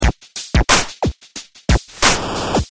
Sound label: Dialog Loop; Motor World Car Factory; android